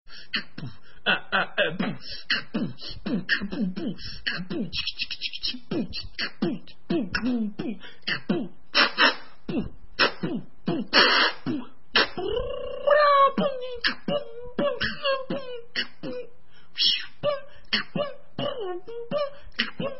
Beatbox

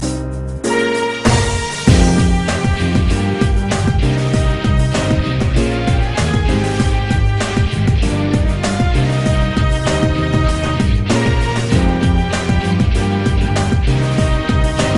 Instrument Part

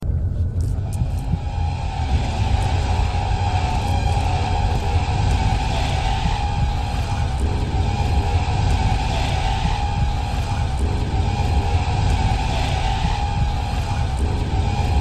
chase Music; Amnesia

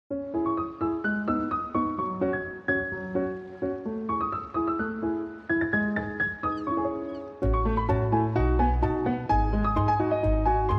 Summer Mood

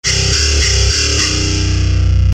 power chords

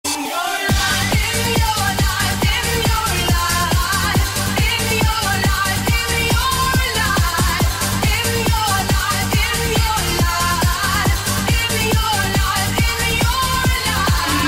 house mix HIT

